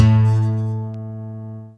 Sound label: lyra; theodyssey